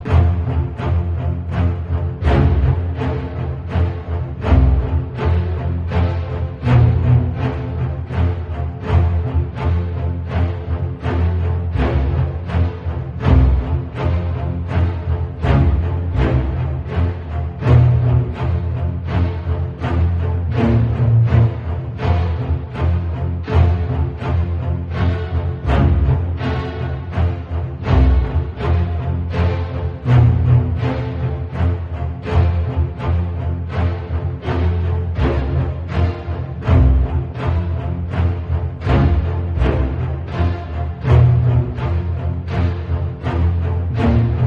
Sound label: Menu Music Low; The Forge